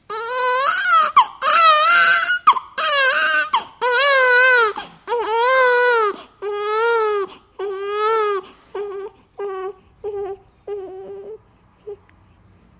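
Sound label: newborn crying sounds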